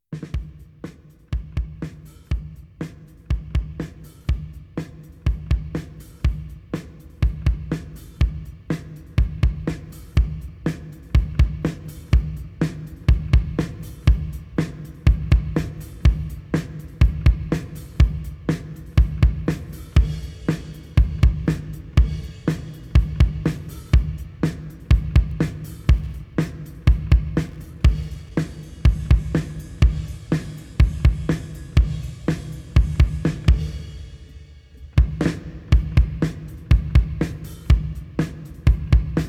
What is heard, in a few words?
drums